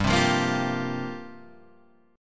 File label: C 7th Suspended 4th G